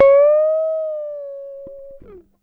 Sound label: GTR TRIK, Jazzistic; Univers Sons